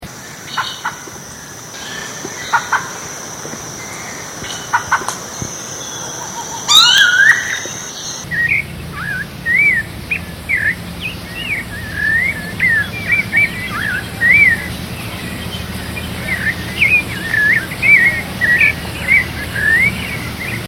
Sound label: clay colored robin